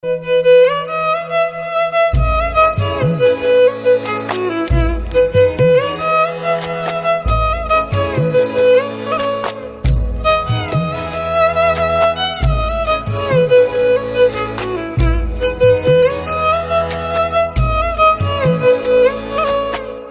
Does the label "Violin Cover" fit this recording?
Yes